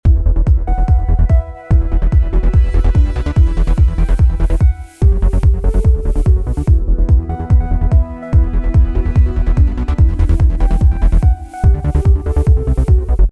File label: BGM; Scratch; 飞机大战